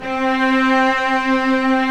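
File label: cellos f; Roland LCDP13 String Sections; STR Vcs2 f vbx5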